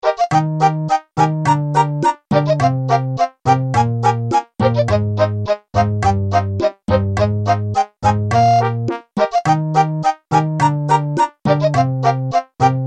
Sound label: bgm; heigen